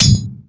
Hit Ground; Shiva; TR3; Playable Tutorial Level

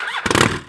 Vehicle Quadbike Start; Playable Tutorial Level; TR3

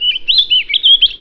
Birds Chirp; Playable Tutorial Level; TR2

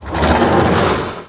Elevator Open; Playable Tutorial Level; TR2

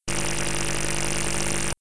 Vehicle Divesuit Loop; Playable Tutorial Level

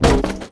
Vehicle Quadbike Front Impact; Playable Tutorial Level; TR3

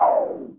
Summon Fail; TR3; Playable Tutorial Level

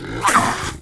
Raptor Attack; Playable Tutorial Level; TR3

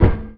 Feet; Sett; Playable Tutorial Level

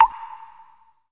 Drips Reverb; Playable Tutorial Level; TR2

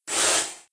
Breathe Out; Hydra; Playable Tutorial Level

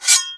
Sword; TR3; Shiva

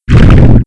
Underwater Door; Playable Tutorial Level

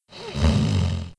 Guard Snore Inhale; Playable Tutorial Level

